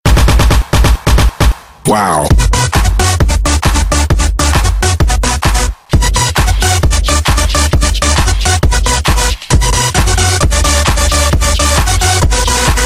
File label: Hardstyle